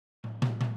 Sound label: Boogaloo - Overhead ST, 82 BPM; Drums; Intro; Sonic Foundry; Drums from the Big Room The Mixes